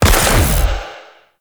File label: Void Angel Portal Beam Fire Alt; Abilities; Warframe; Zariman